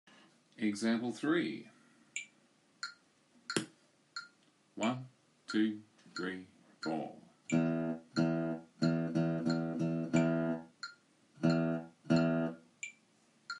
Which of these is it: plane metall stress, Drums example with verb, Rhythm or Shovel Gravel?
Rhythm